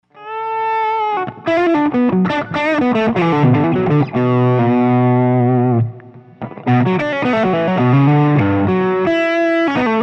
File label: WGS12L drive